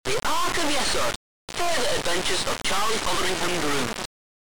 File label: Title AY Beeper; The Arc of Yesod